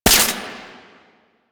Mech; Warframe; Fulmin Prime

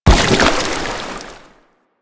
Nechro Mech Step Surface Water; Step Surfaces

